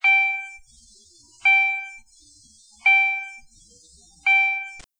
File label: tone gong